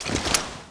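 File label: body movement gear; Spearhead Demo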